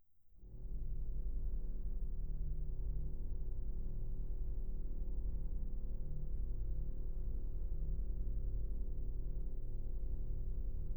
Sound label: film score Fanfare; 2001: A Space Odyssey